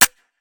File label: Musket Foley; Sound Ideas; Guns